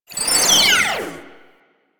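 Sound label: Sentient Conduit Projectile By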